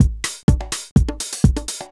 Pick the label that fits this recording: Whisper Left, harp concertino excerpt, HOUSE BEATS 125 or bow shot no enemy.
HOUSE BEATS 125